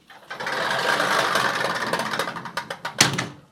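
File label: close Japanese slide entrance door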